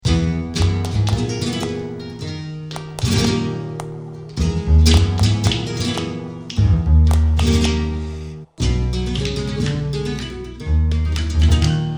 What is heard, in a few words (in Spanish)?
tientos